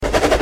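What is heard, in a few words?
Train; Trein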